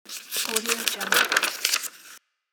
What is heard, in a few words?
paper page fold